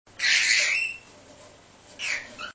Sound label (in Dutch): protest, agaporniden